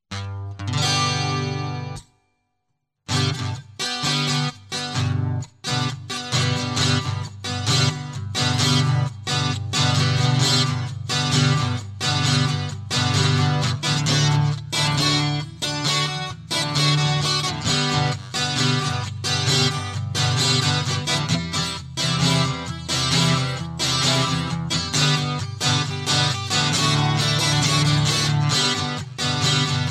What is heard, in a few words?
Guitar Loop